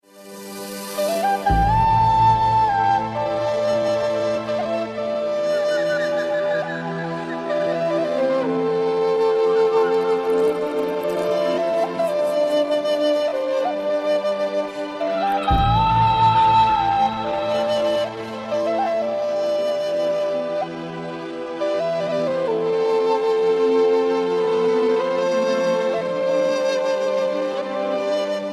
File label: relaxing flute; ringtones